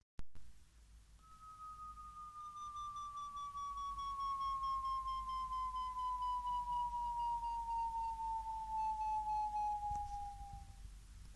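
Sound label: linear chirp down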